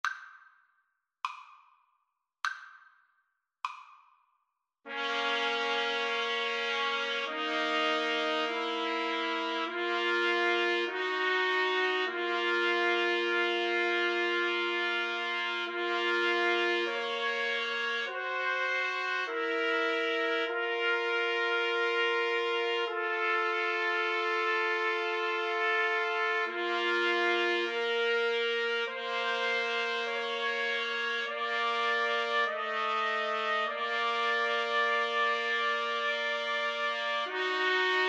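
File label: trumpet trio, karaoke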